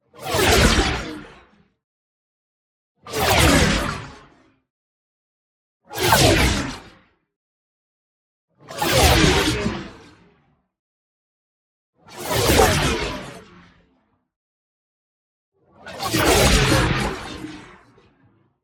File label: WHOOSH SMALL Molecule Flurry; boom library; Matter